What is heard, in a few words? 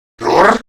Surprised Grineer Male; Warframe; Battle Barks